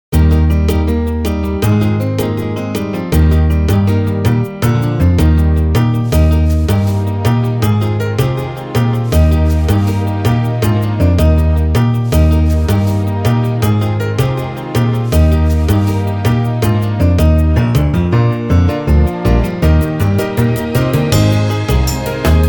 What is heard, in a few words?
backing music